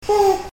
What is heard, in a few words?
sighing